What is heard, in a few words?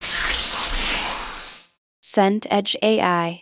whoosh water